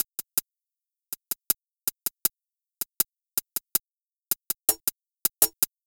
Hi Hat; Sonic Foundry; Sony Creative Software; Hydroponic Hip-Hop; Beat Components; Hi Hat Cymbal Patterns

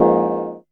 MD BELL; Back In Time Records; Big Bang; TDP+BELLS2

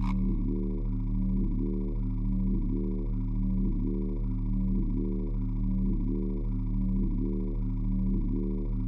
Alien Sp, Drum Loop, Yamaha DTXpress

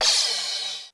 Crash, Korg DSS-1 Drums

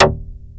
TURBO ACID

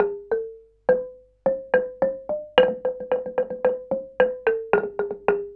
KULINTANG; Spectrasonics; Heart of Asia; KULNGTANG PH

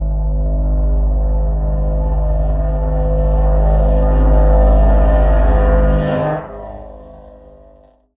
strain

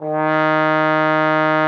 TROMBONE D#3; Xsample VOL-3

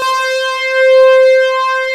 MGP LEAD; Pro-Rec - Synthsonic; JV ANALOG1

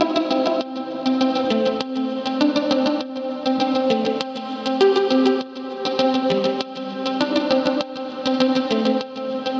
A Gtr Cotton String; Zero-G; Future Wave

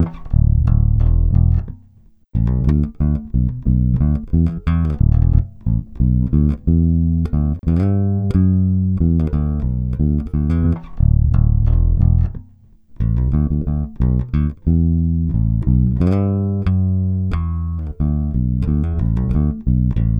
Electric Bass

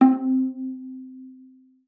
C4, VIOLA PIZZ; Xsample VOL-5; 90 s Sample CDs

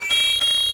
MET SW SIN, SWORDSING

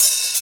HH, REAL

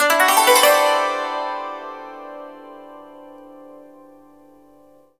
YANG CHING, Heart of Asia; Spectrasonics

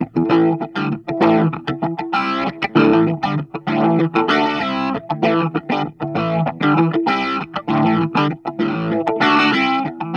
ORGANISM, Funk Guitar; 90 s Sample CDs; Zero G

